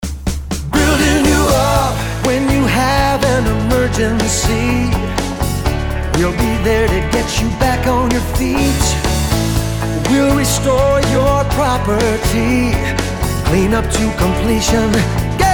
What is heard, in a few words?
jingle